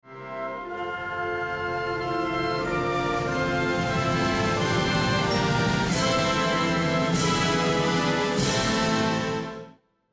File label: lose jingle; Russia